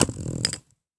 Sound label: pen on a book